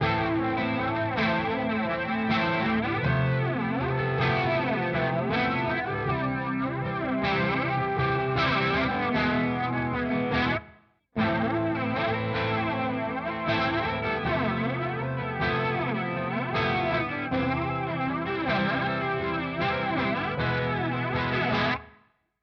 Walrus Audio Polychrome Clean Voice Switch Up

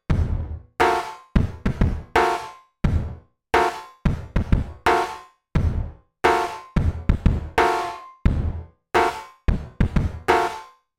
Yamaha YS-200 FX Distortion Drum

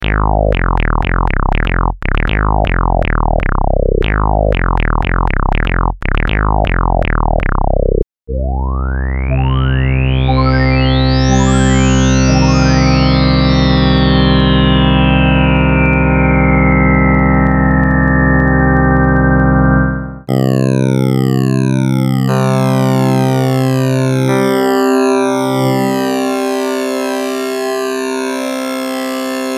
lowpass comb moving filter

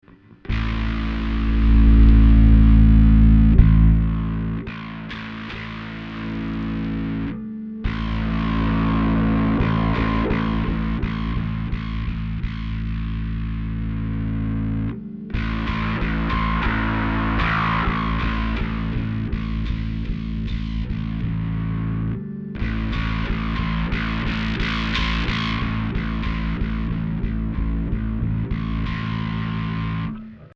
Darkglass Microtubes X7 Eq

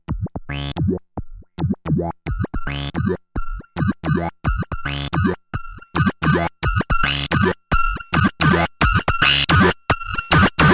Rossum Electro Music Evolution Species FM Mod

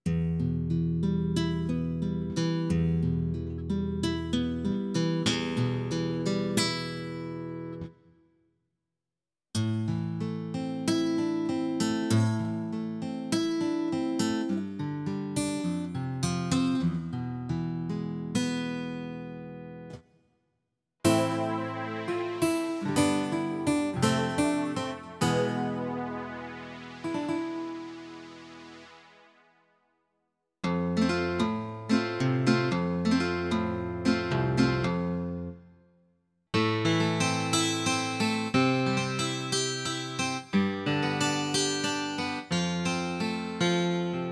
Casio CT-S1000V Guitars

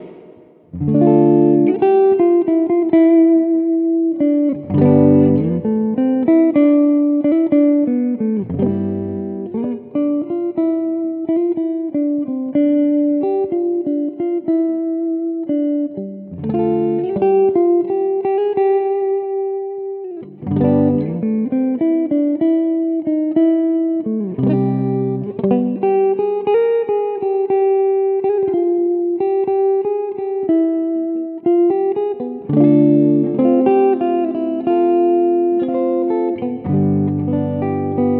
Neck Pickup

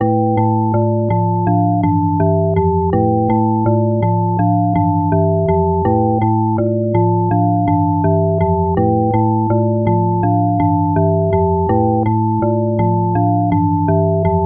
Key Sequence pitch straight; Sound Particles SkyDust 3D

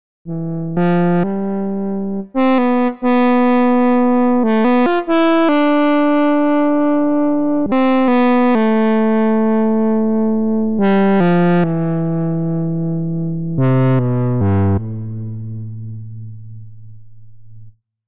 Gforce AXXESS Sad Sax

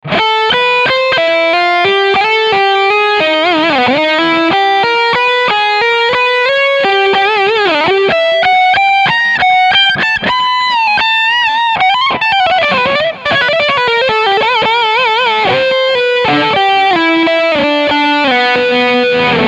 rock

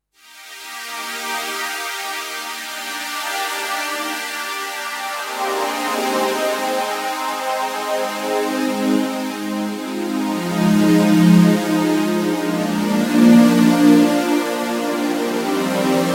HP 2 Pol Cutoff 115Hz 4 percent Reso

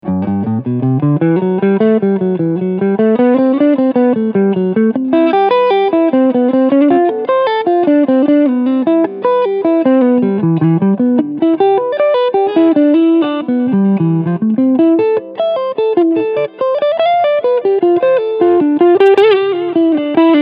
Framus Stormbender Clean Sound Front Humbucker; Framus Artist Line Devin

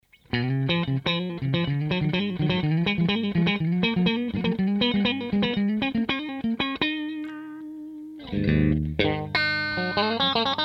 Strat Clean Gain 1h Treb 2h Mid 11 Bass 11

